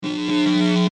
Electric Pulse Swell, Electricity buzz burst swell; LYN111 package of Electricity sounds